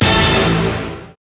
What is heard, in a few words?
pickup; midnight guns; vhs